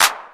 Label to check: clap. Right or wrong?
Right